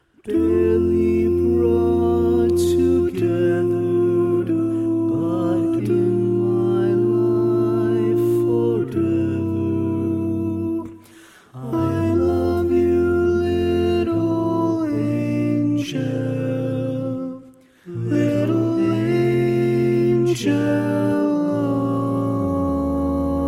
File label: Tenor, barbershop tags